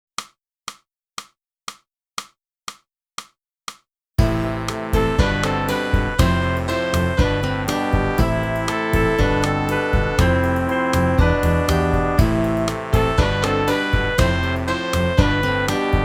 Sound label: flute